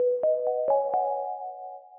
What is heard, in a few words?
level intro; afterburn sfx; inbento